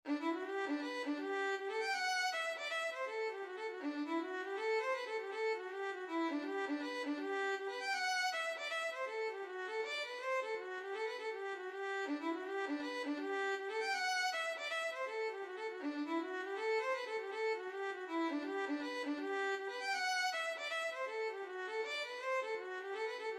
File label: hornpipe violin